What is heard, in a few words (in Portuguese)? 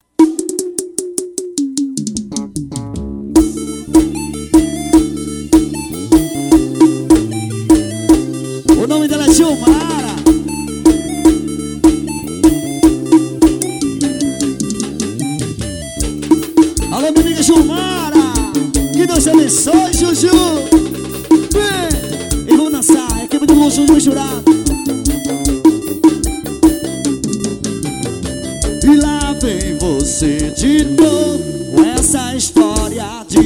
ao vivo medios graves; by nailton cds